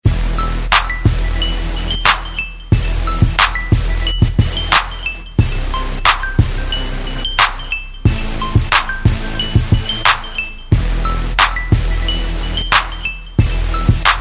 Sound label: Cool Sound